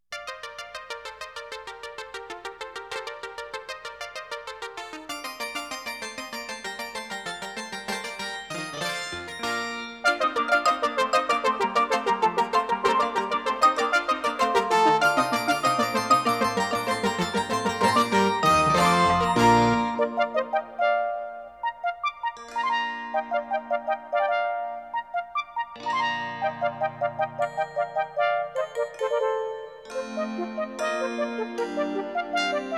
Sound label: Rondeau Gracieux